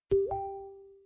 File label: Notify Tone; Facebook